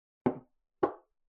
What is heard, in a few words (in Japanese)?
グラスを置く音